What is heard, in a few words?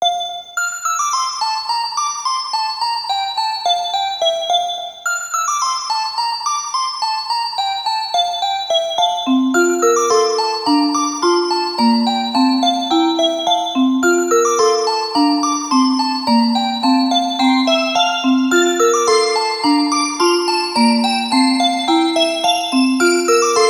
Alarm; Entwined Alarm; Sony Ericsson; PlusFourSix; Ying (F305)